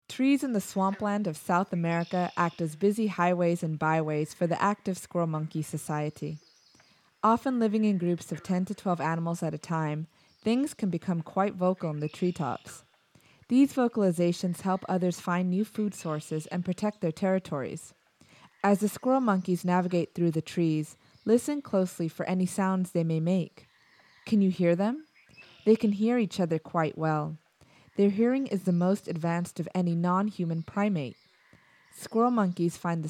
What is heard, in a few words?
squirrel monkeys; sazoo; ipod